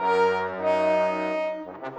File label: Brass